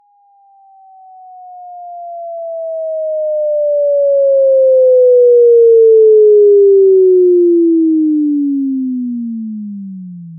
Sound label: 440Hz neg chirp